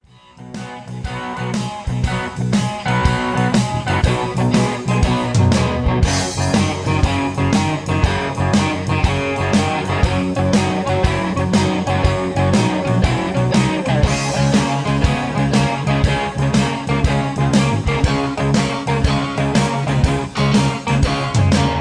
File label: I-V-VI-IV Texas Boogie F 120; Peach Jam Vol 1 Part 2 Texas Boogie